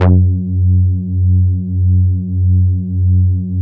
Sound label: MOOG TAURUS F#2; Big Fish Audio - First Call Vintage Keyboards